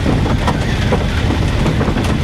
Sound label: Scrape Bookcase Slide Loop; Doors